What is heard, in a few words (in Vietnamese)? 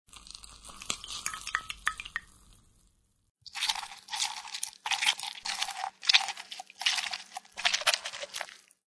danh va vat nuoc chanh